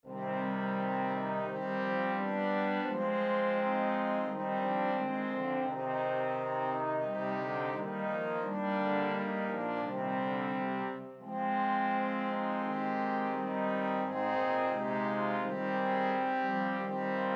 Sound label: Trombone Quartet